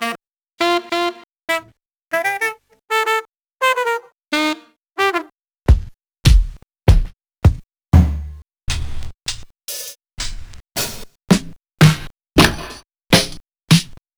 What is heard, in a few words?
Drum Kit 12 Bit; Nuttkase